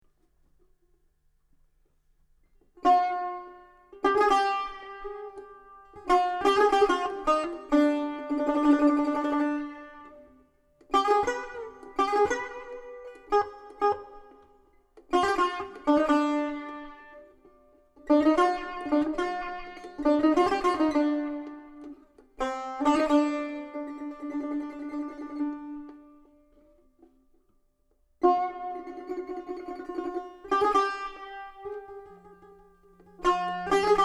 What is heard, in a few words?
Daramad